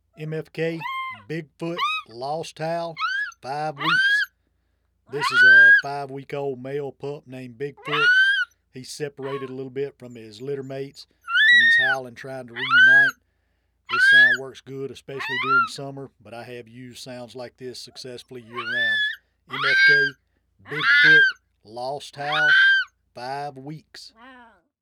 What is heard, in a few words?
Lost Howl 5wk; BigFoot